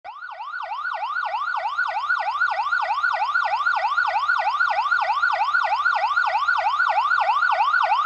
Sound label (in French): sirene americaine